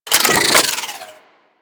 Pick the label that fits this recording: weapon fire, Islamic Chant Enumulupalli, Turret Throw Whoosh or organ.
Turret Throw Whoosh